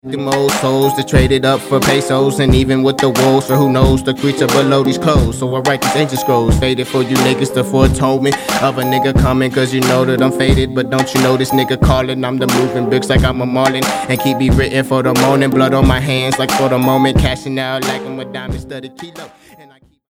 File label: HIP HOP